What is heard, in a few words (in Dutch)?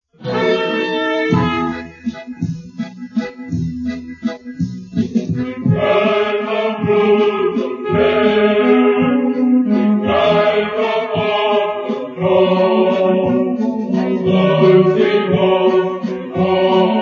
Tsjechisch Drinklied